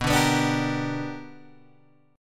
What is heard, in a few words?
B-Minor Major 7th Sharp 5th B down Guitar Open G